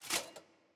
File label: ambience room armor training